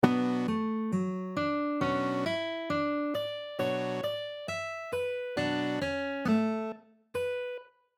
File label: Random Play notes sounds like